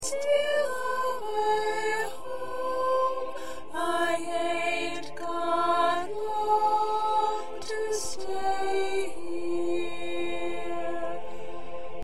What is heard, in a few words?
Soprano